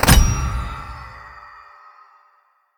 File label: use key to unlock; Crypt of the NecroDancer